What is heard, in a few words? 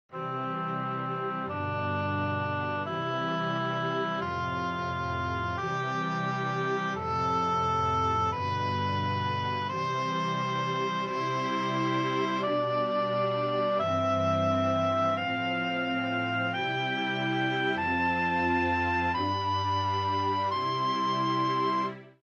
Major Chords